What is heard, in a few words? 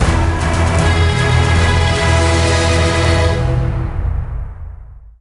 Sting Boss Start; Percy Jackson and the Olympians: The Lightning Thief